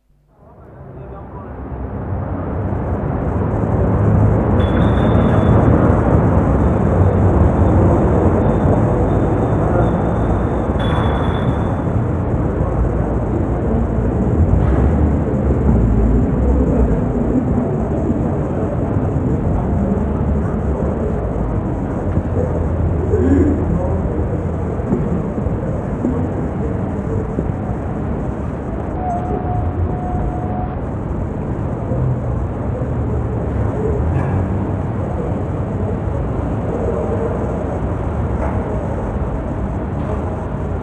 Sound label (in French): soir 20h